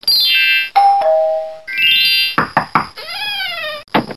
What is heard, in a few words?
buzz